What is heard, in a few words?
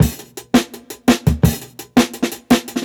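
Jungle Breaks, 168 bpm; Original Jungle Breaks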